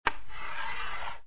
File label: chalk